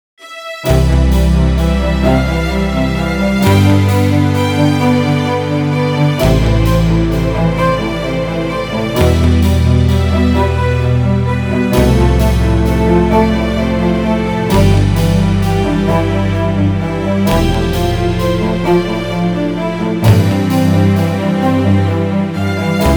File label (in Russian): Музыкальная тема; сочинение-подарок; на день рождения моей матери